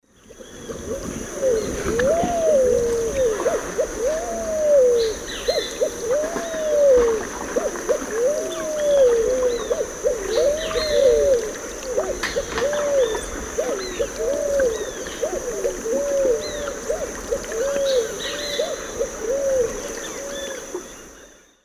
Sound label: Patagioenas cayennensis, songs 2 birds; S. America; Eastern Lowlands